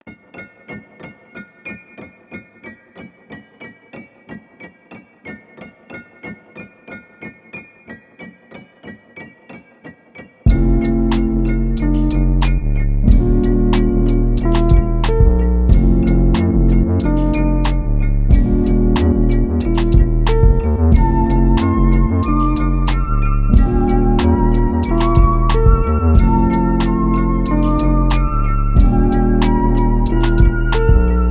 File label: Mystic; Grindstone